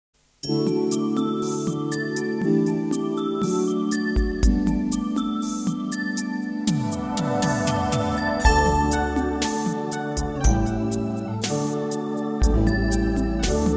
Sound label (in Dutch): FEEST karaoke